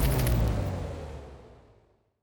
engine stop, sci-fi sounds, mechanical

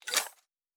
Weapon Foley (Rocket Launcher); Sci-Fi Sounds

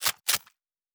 Weapon Reload

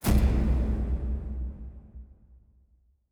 Complex Interface, Sci-Fi Sounds

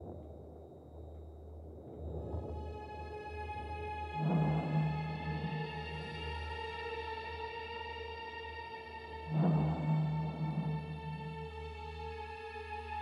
Suspense Loop 90bpm, Horror Sci-Fi Music; Dead Ark

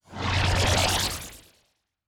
Teleport, Sci-Fi Sounds

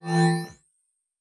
Teleport, Sci-Fi Sounds

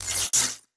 Glitch, Electric; Sci-Fi Sounds

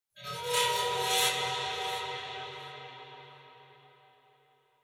Action Transition, Classic Horror Sci-Fi Music; Dead Ark

